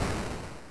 boing

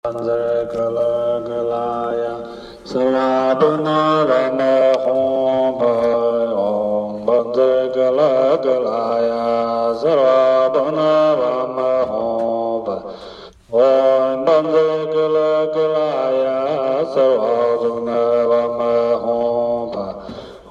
Vajrakilaya mantra

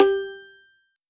attention bell ding; mixkit

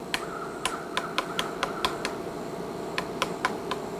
woodpecker Tapping; woods

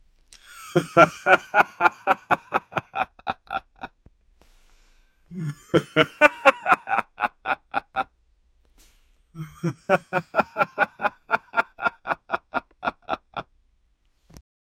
a man laughing

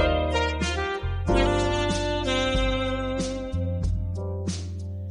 ai music example

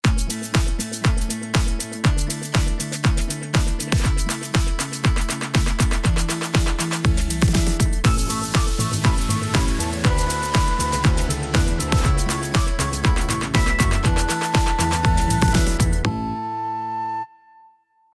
happy, AI music

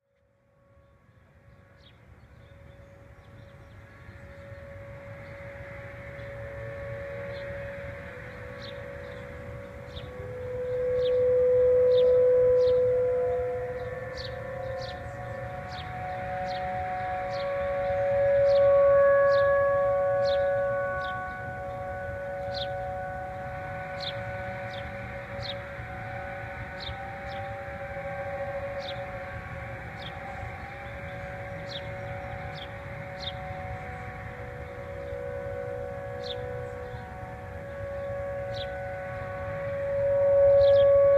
sirens

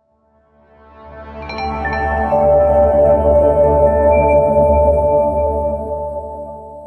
landing logo intro

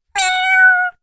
miau

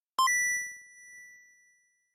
sound boot

